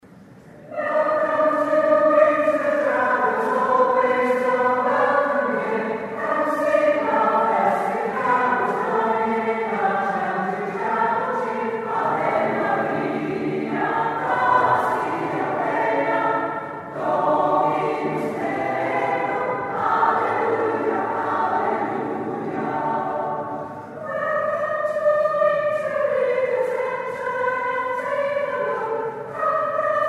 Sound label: carol; Chantry Chapel